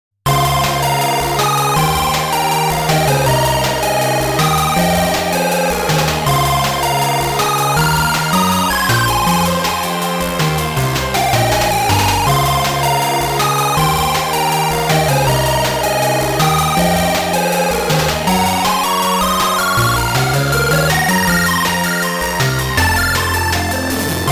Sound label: 8bit